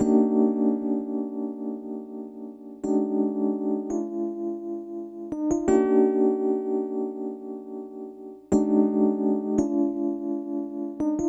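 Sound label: rhodes, B, Hip Hop & RnB; Never Doubt Me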